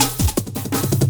LOOP SD, Drum & Bass, 165 BPM; Ueberschall; Drum & Bass Resonance

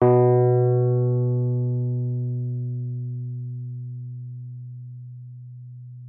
WURLITZER, B2; Xsample VOL-1; 90 s Sample CDs; WURLITZER RS

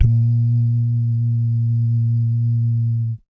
BS DUMMP; Spectrasonics; Vocal Planet; Jazz FX

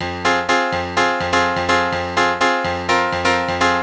House Piano (125BPM), retro house

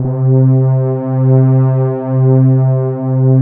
pad C3, synth

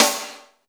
SD, Drum & Bass, 160 BPM; Ueberschall; Drum & Bass Flash Trackz

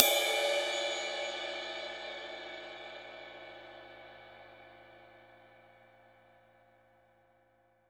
RIDE, Drum Dry; East Collexion; Drum 1 Dry

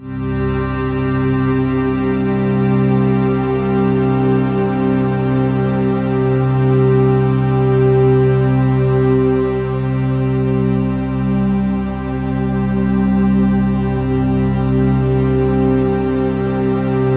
CELTIC, SYNTH SND, Ominous Drones; USB Soundscan vol.48; AKAI

